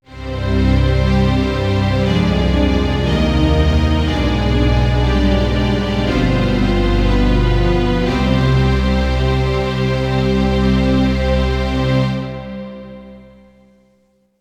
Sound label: music; Starship Troopers